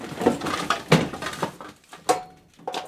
searching; best service Blue Box; mixed sounds short

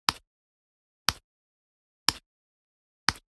Generic Button Wood Small

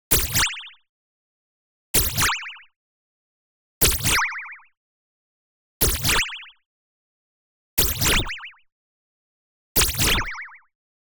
movement fast, warp burst shudder double; boom library; Anime Essentials; DSGNMisc

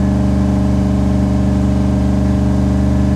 Truck Dakota Loop; Trucks

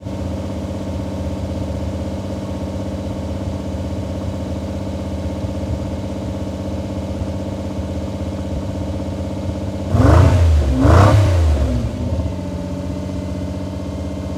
Lamborghini Idle Revs; Automobiles

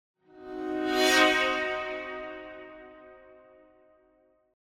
Symphonic Hit Short Show Up Chords; Hollywood Edge; sound designer's toolkit v2

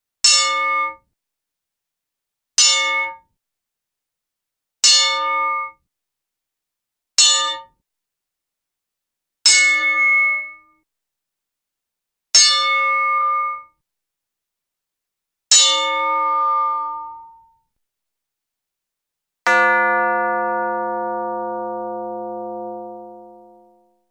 Boxing or fire Bell Hits, Cartoon; Hollywood Edge; Cartoon Trax

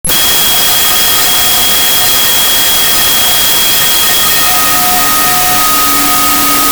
School Bell Ringing; 1001 Sound Effects; Foley

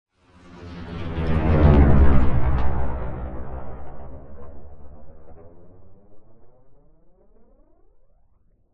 Space Ship By - Buzz Engine - Slow Pass; Hollywood Edge; sound designer's toolkit v2

